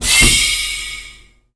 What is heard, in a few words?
kicker; gusanos promode